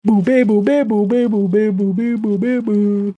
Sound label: bassline